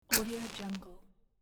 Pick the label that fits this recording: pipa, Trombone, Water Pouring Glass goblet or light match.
light match